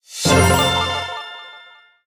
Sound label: freespin end; Amatic; Book of Fruits